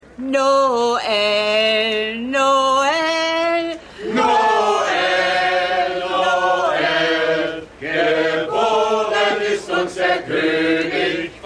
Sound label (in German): Gesang; Trapper John